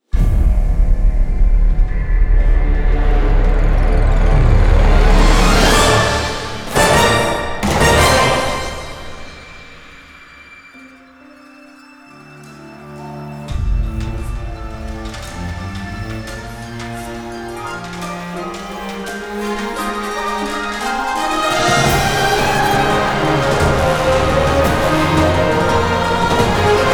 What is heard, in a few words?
orchestral